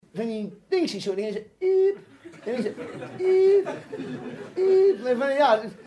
ieeep

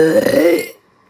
burping

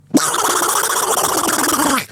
duck noises

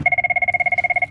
school phone ring; cisco

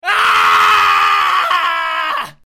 scream

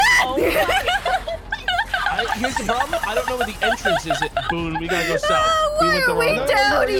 panic laugh

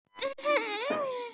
ahhh sound

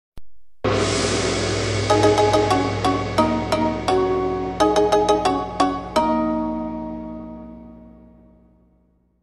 cartoon china tune east asian riff

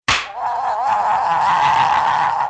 smack and spin

